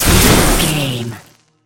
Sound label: electric lightning blast